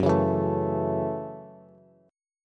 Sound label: Gb Minor 7th Gb down Bass; strummed; JGuitar